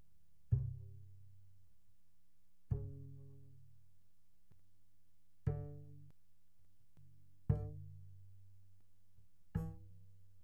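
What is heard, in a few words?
Bass pizzicato mezzo forte sul E C3 E3, doublebass; Strings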